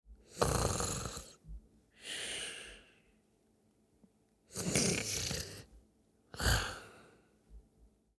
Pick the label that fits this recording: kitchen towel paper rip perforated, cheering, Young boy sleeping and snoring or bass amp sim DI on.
Young boy sleeping and snoring